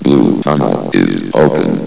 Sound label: blue tunnel open, ambience